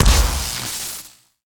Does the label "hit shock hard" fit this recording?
Yes